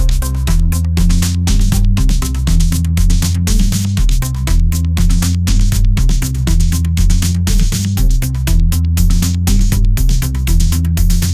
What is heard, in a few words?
pop groove, ringtones